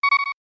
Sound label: Low Battery, ui